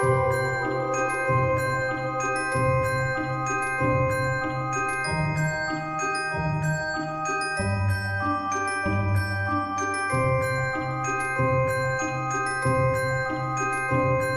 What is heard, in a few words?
Fairy bell